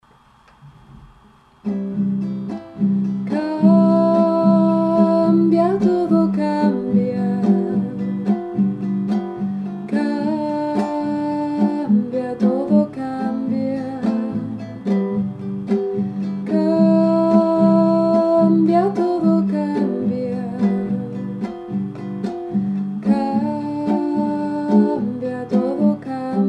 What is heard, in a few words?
high harmony